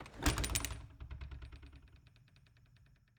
Cabin, front door, split door, oak, large, iron fittings, open, hyper; Eiravaein Works; Vaeyan IV